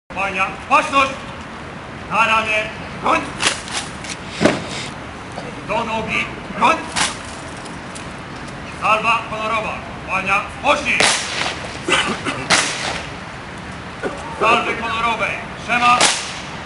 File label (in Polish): salwa honorowa; UROCZYSTOŚCI PATRIOTYCZNO - RELIGIJNE W MNISZKU